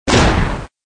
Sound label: Enemy Destroyed